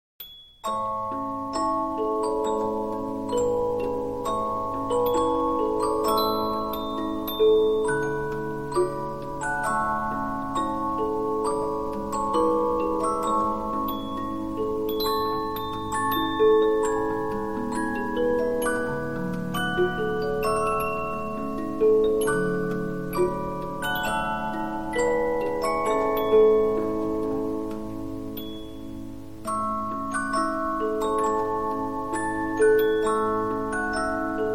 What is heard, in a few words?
Polyphon, 15inch disc musicbox